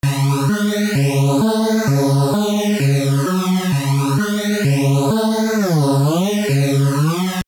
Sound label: LEAD